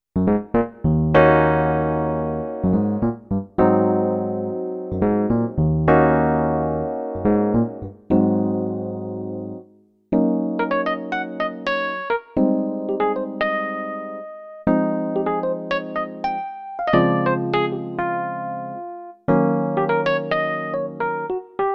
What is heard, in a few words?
Yamaha NP32 E-Piano